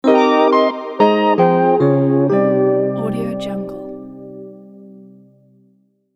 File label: fail in